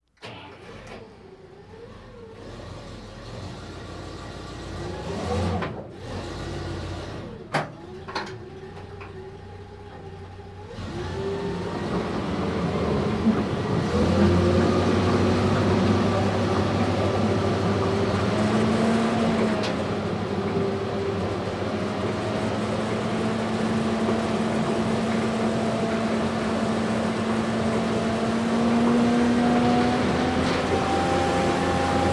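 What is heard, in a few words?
APC Interior Startup Idle, armored personnel carrier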